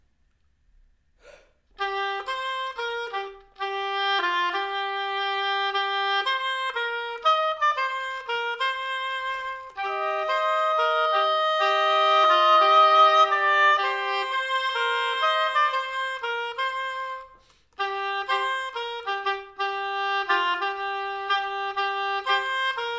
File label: jazzy oboe duet teacher student